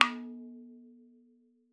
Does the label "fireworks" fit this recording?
No